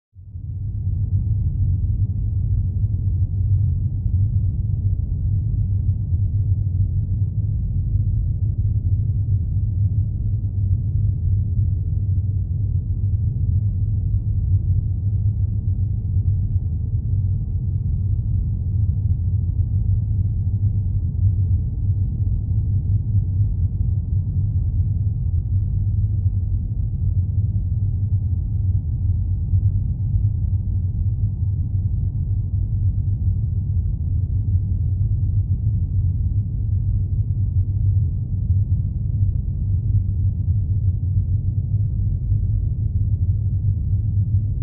Drone, horror

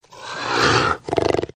Puma Cougar Hiss Shor, big cats

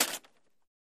Paper Puncture Soft; paper mvmt

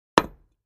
Patio Block Hit Sledg, concrete & brick

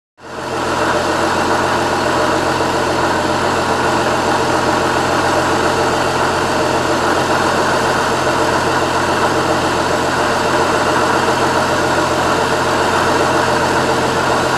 Boat Engine, powerboat

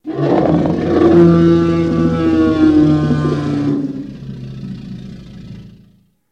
Dinosaur Roar Moan

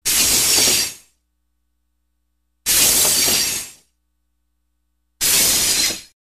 Loco Brakes, brakes

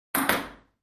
Ping Pong Ball Bounc

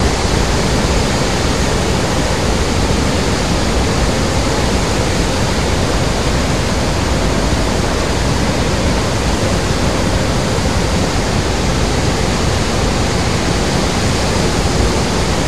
Waterfall Low Roar

